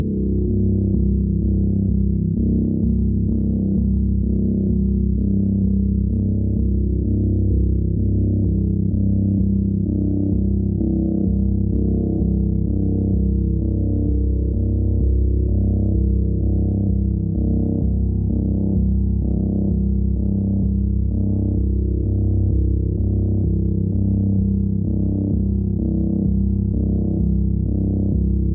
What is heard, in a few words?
Light, Horror Atmospheres Music; HauntedBloodlines